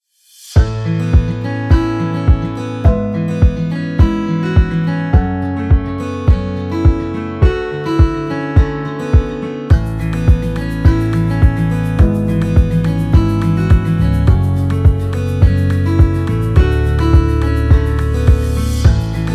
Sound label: instrumental acoustic guitar